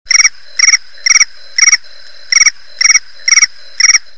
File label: Cricket